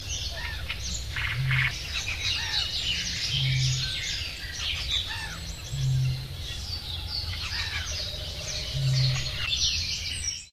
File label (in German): rohrdommel